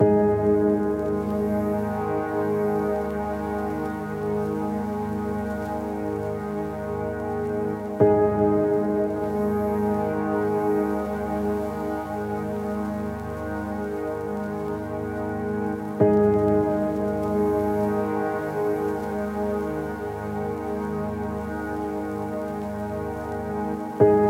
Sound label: Piano - Abmaj 60BPM; STEMS; Ghosthack; SkyRealm; Mood Kits; Mood Kit 06 - Inner Reflection